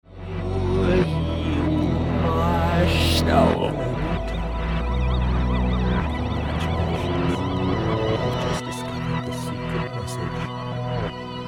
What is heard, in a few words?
empty backward